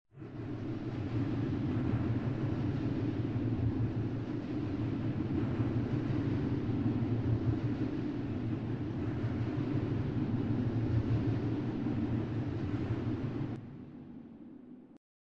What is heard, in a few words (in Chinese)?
地铁行驶环境音效; 爱给网